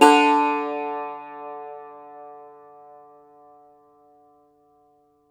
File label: cumbus saz D, plucked strings; E-MU Producer Series Vol. 7 – Old World Instruments